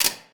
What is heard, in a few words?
Menu Click